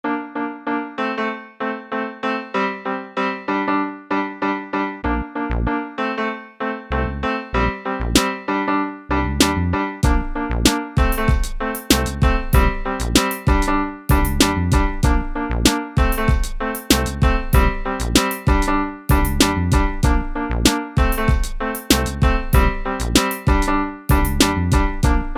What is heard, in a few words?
instrumental